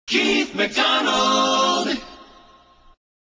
jingles logoset accapella; priority one

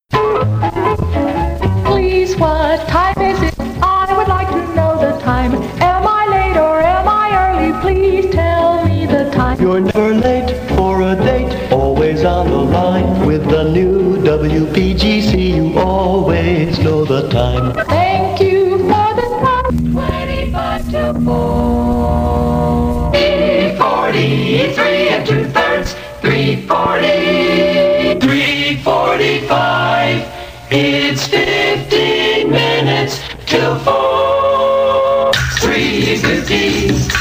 jingles time sings

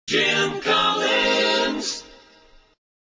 jingles logoset accapella; priority one

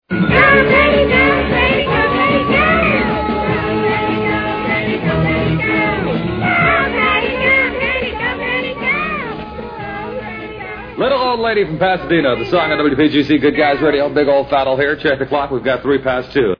off key singing club bit

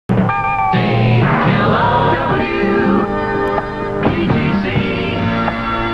jock jingle

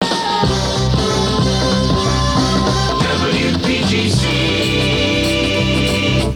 jingles; drake aa image pack originals

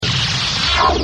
stinger only mono

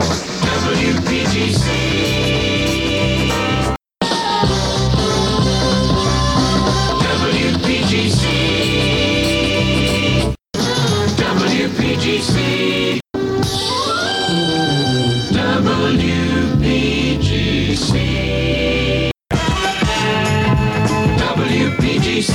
jingles image pack montage originals; drake aa

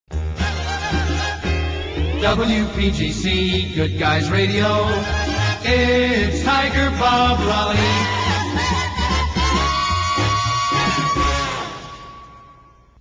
jingles; pams

